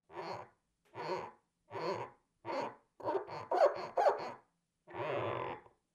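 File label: clean dishes sound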